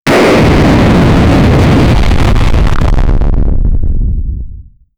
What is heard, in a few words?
beam